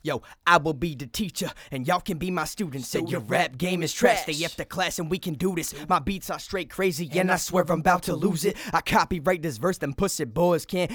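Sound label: hip hop 90 B minor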